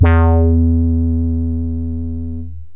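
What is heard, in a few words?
wah square, yamaha rm1x synth bass and lead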